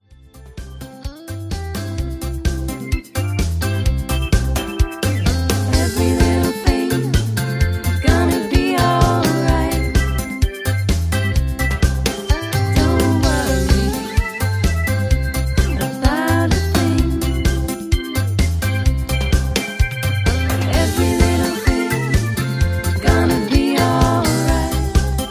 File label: High Key Soft